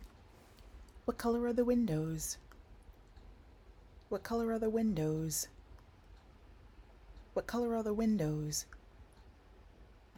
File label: Windows